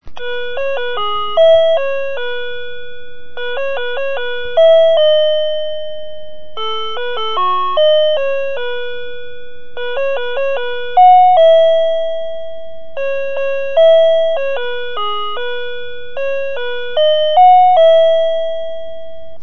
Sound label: chime, quartz clock